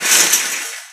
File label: metal chainlink impact soft